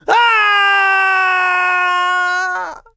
Enemy Generic Human Death Falling

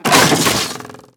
Water Splasher

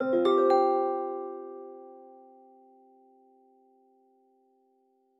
Plant pattern arp

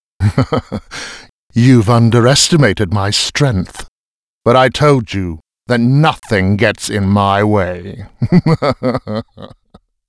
Outro Fail US; arthur and the revenge of maltazard; wii

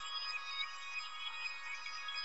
shard Light Loop; unsighted

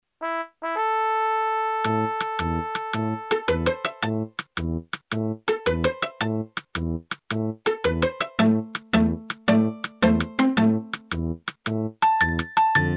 Ring Tone (Poly); Smeshariki Kaleidoscope of Games

